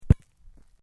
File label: Sport Soccer Bounce Grass; Soccer ball sounds